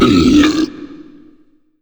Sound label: body shoots; pestilence